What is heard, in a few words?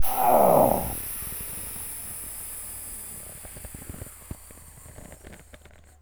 death; sven; player; cleansuit